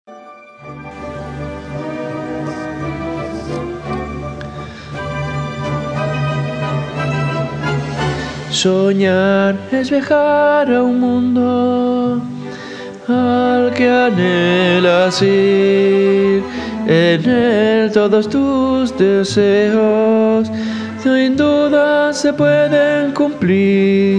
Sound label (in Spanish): Soñar tenores